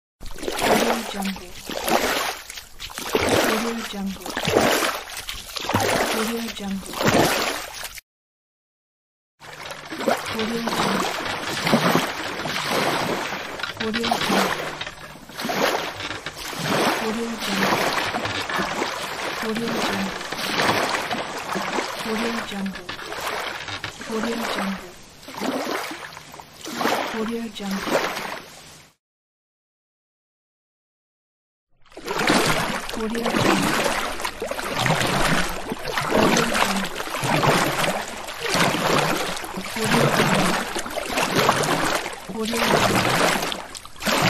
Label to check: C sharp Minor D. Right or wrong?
Wrong